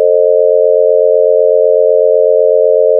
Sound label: 567 sines right